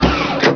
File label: butn